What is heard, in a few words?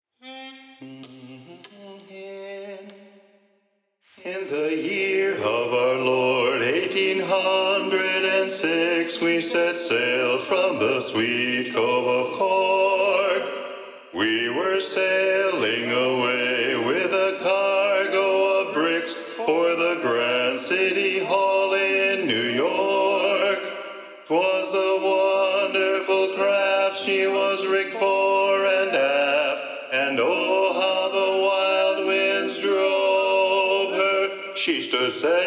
Bass Predominant; sea shanties; The Irish Rover 2